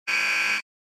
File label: Electromagnetic Buzzer, Dry